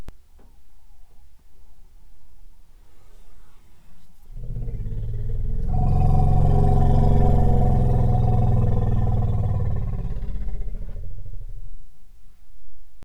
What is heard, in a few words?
elephant calls